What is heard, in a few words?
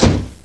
door slam